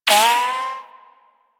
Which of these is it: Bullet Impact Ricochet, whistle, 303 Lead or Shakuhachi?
Bullet Impact Ricochet